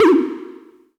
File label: Percs, Elektron MachineDrum; SPS1 MKII